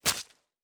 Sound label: Stab; Fantasy Sounds Bundle; Medieval Combat Sounds